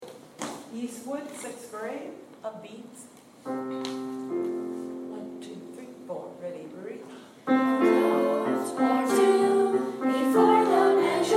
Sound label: Upbeats